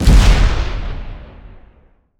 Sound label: rocket boom, weapons; Cosmic Rage; general combat